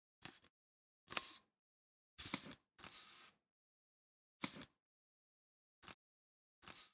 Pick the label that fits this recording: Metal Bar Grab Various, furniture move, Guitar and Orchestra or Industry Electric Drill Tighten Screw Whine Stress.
Metal Bar Grab Various